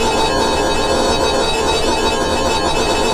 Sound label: Looping Sci-fi Rumble